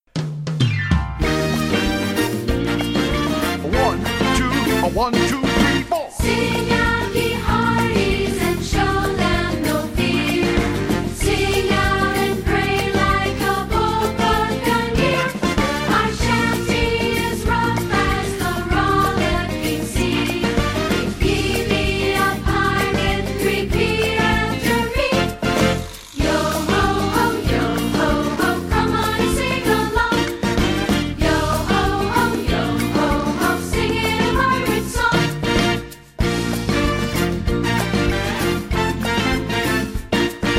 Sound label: Singin a Pirate Song Vocals